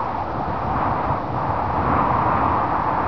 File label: wind gusty; ambient; Source Engine; cstrike